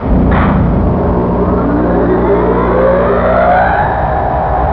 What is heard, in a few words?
big engine startup